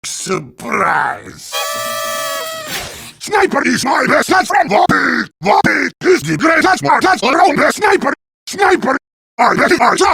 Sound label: Acapella; Heavy's Bestest Friend